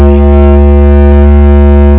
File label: bamboo drone